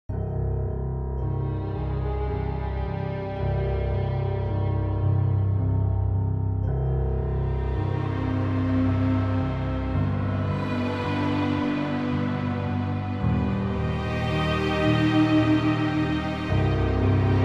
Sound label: Amb Slow, music; Louvre; The Da Vinci Code; XBOX